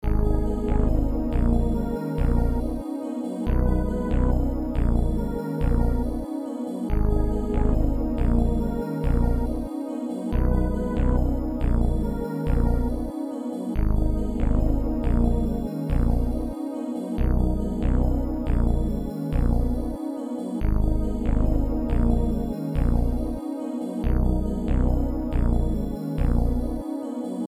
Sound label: test melody 140 bpm; Yamaha; Prod Moon